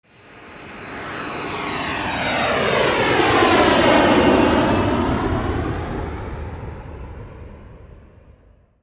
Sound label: jet take off and fly by sound effect